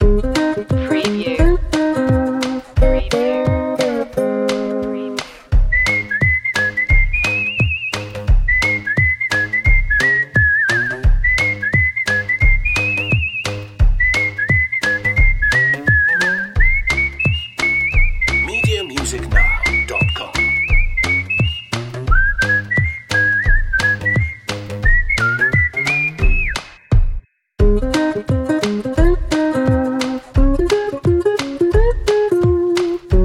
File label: whistling dreamers